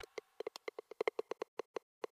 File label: roulette falling